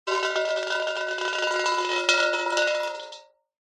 nature water drips short metal resonate ring hollow